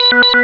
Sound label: myumyu; ChatData